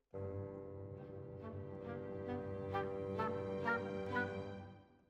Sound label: Oboe